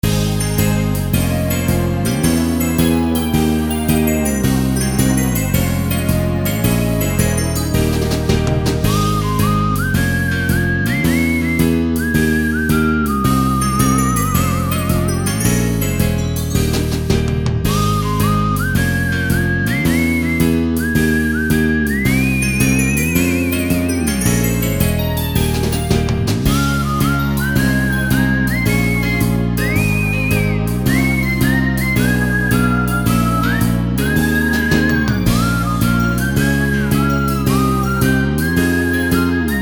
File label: slow sunset whistle duet version